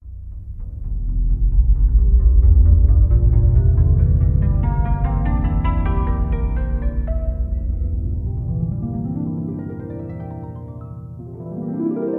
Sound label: Miracle Jingle Slow